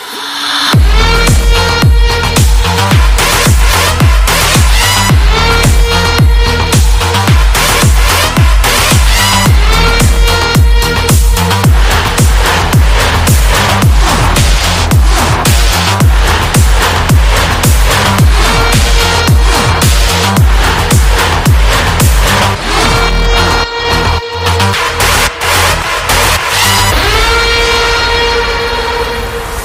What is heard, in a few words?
Instrumental; Toxic Movie; Toxic Bgm